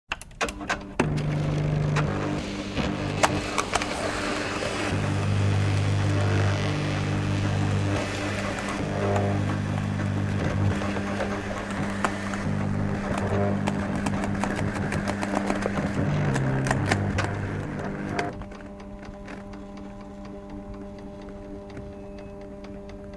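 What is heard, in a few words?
sounds of photocopier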